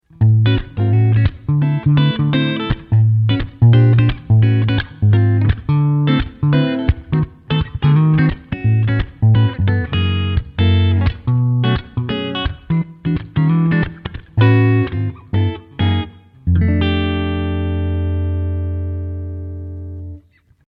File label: Framus Diablo